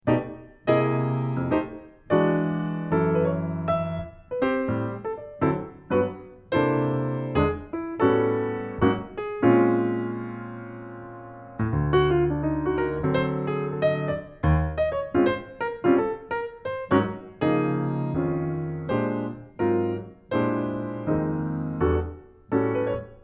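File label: solo piano arrangement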